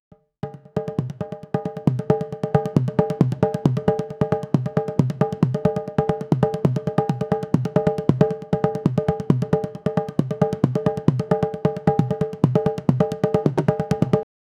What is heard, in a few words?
tabla dhol drum beats; dance music